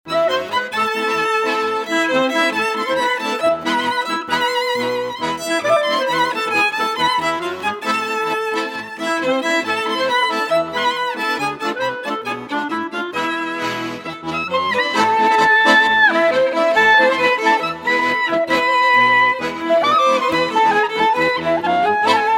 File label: Rumenish Bulgarish